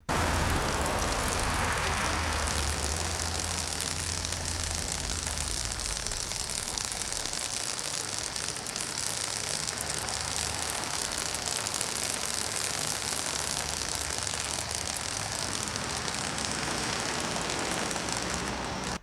sparse raindrops splattering on plastic tarp

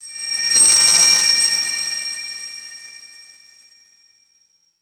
synthetic metallic chimes with rapid delay

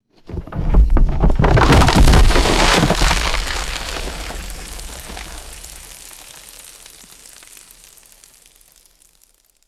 heavy debris and dirt falling on wood

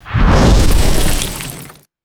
magical ice projectile whoosh and shatter; swooshes

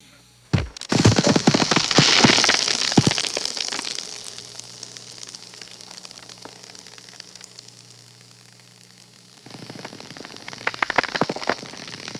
slow tumbling rock and stone debris

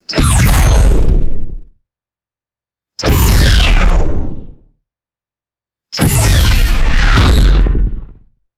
heavy sci-fi energy weapon blasts, lasers

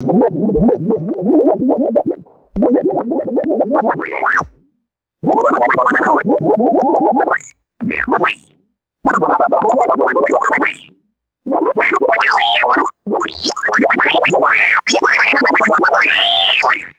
bubbly synthesized droid vocalization; robots

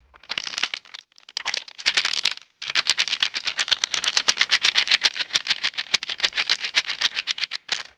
medium rocks shaking in a bag

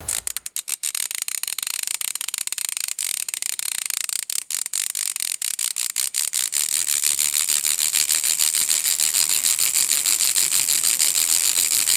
fast accelerating mechanical ratchet clicks

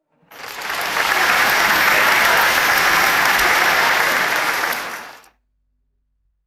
light crowd applause in an auditorium; crowds

